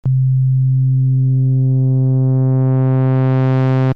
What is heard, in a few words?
sine; nord3